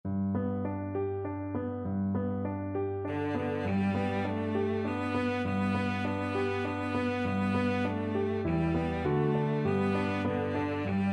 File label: shabat hamalka, cello